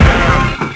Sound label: Tech Hit (12281Hz); Sonic 3; M8; Genesis